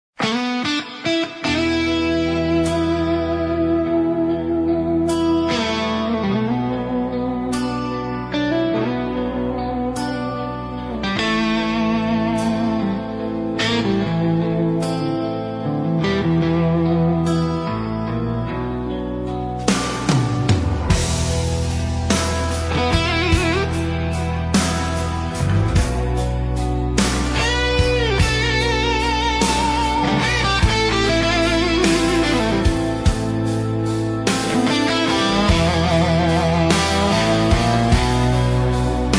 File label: Rock